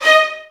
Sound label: VN FX, Virtuoso Strings